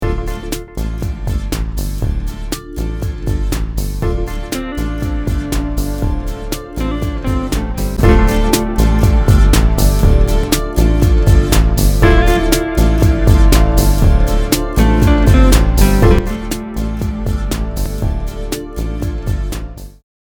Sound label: STEEL no chain loud